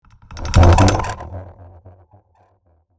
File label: Industrial equipment metallic squeak